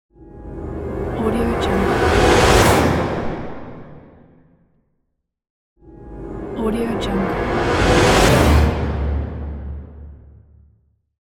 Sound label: horror orchestra hits